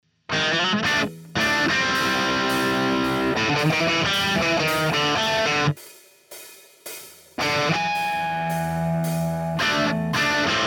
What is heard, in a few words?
CRUNCH, guitars